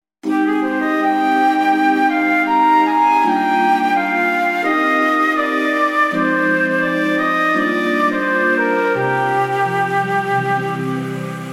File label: Waterfall, v-synth